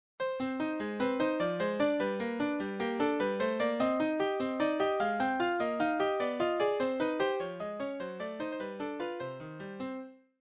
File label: Alberti bass; Beethoven WoO 78 Variation V opening